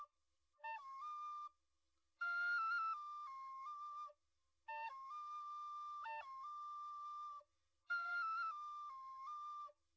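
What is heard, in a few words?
no drums, instantaneous mixture; SiSEC11; underdetermined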